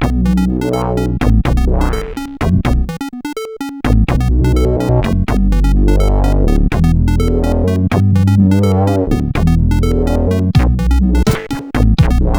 Game Music, sid CSG8580R5

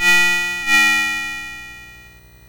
BASIC sid MOS6581R4, GAMES; Sword of Fargoal

